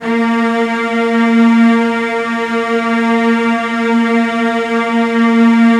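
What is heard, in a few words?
CELLOS C4, Strings; ILIO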